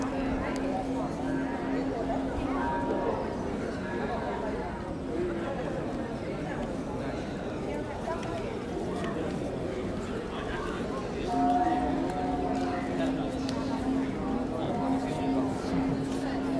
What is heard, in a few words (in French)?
pagode